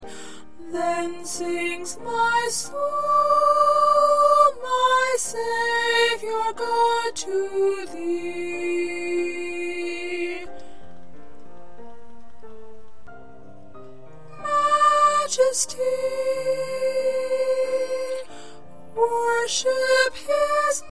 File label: Soprano, choir parts